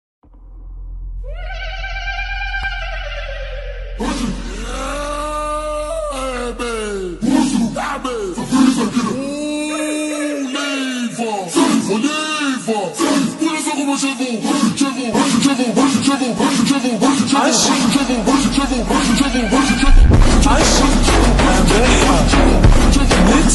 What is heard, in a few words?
danca phonk remix slowed reverb